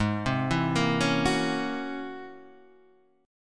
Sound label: Db Minor Major 13th Ab